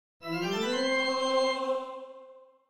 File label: Happy Pipe Organ